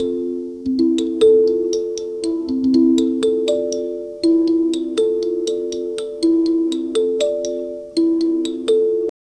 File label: pure baby sleep lullaby w